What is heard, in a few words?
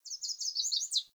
Sparrow Song; Animals; Vocalizations